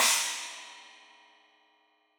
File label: China, Crash, China Cymbal